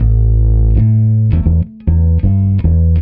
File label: Reggae, Bass; ACID MUSIC